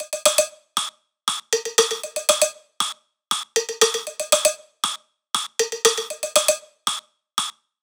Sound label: 118 Drums Tops